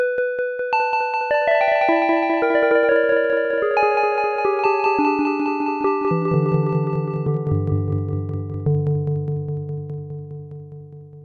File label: echo; emu workshop